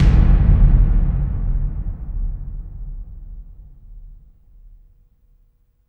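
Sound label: CAVERN, AMBIENT DRUMS; Spectrasonic Distorted Reality 1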